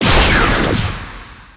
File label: Enemy Lost Attack